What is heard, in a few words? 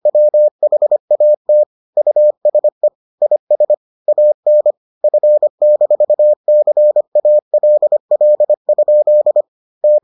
morse